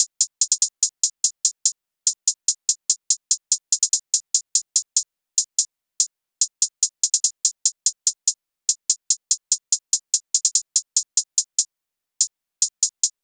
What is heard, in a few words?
Hihat Loop - 145 BPM, Hip Hop; Nada